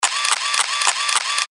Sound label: camera autowinder